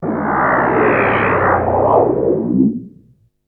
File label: FX, Drum & Bass